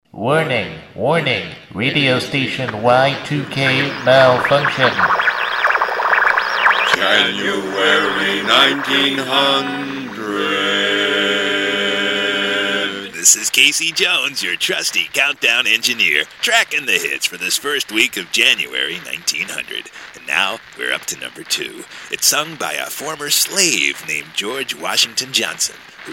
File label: Casey's Countdown